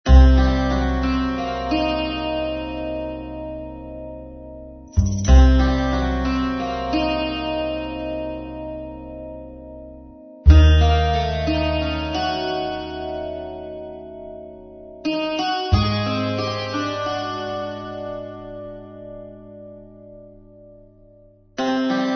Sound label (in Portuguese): suspense; globo